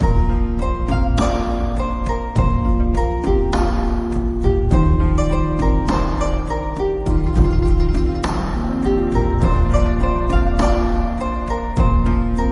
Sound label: bgm; WaterMarginFishing